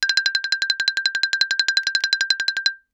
Xylophone rosewood roll ff G6 stereo; MIS Pitches - 2014; Percussion

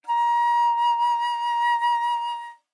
Alto Flute vib ff Bb5 stereo; Woodwinds

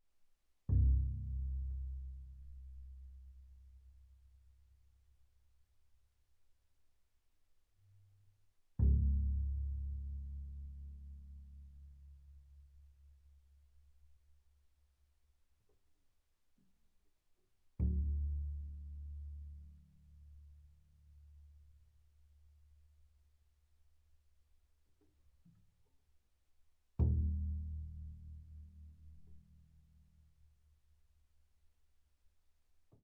Bass pizz sul C mf C1 Eb1, double bass

